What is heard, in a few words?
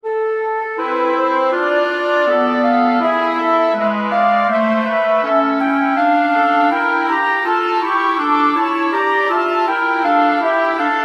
classic chord change